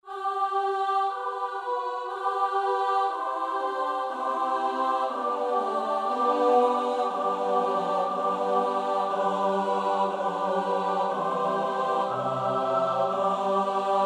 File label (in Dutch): S-A-T-B, koorpartij oefening; Röntgen (Julius); 6 oude Nederlandsche liederen